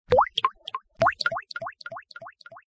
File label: notification; Etuline S4521